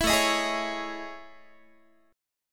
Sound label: A Major Sharp 11th Guitar; AM#11 strummed; 19,17,18,18,17,x; 4A,4E,5A,5D,5G#,6C#